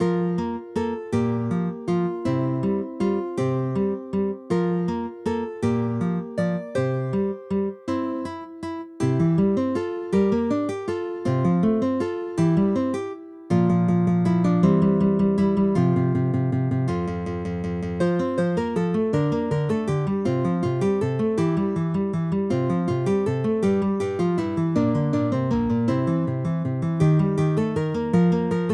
SURF Guitar; Pokémon Prism

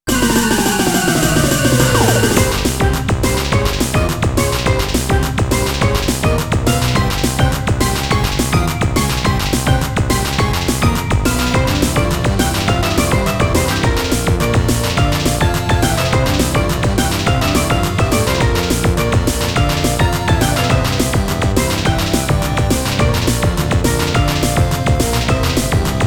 Wild Battle Techno; Cat333Pokémon; MIDI Renders

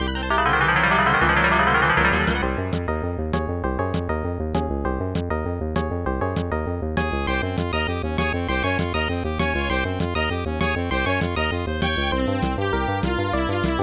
Trainer Casio-Yamaha; Cat333Pokémon

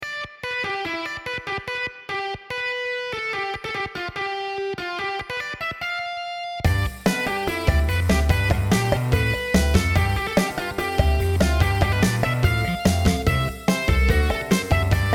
Glam Rock; FInal Fantasy IV

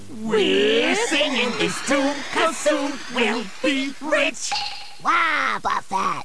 Team Rocket Sings; pokemon sounds

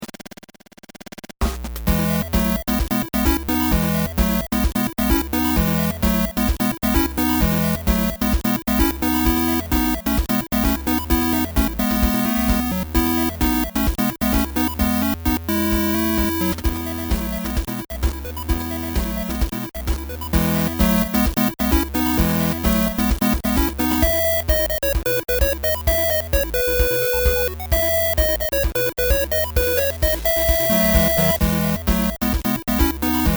8-Bit; Super Mario RPG

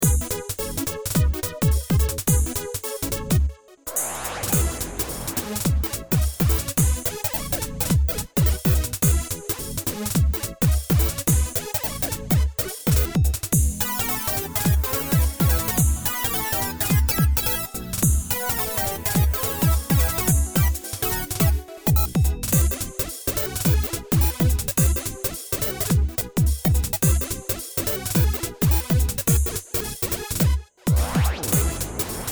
Techno; Super Mario RPG

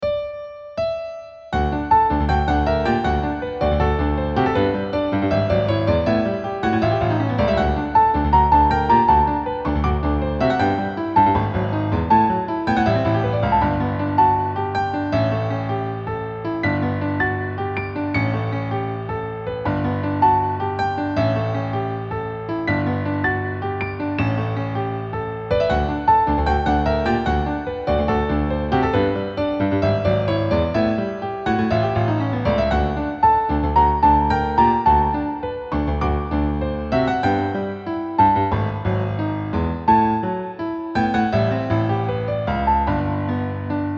Piano Symphony; Pokémon Gold, Silver, & Crystal Versions

